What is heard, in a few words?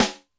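Snare Edged Out, Alesis D4 drum machine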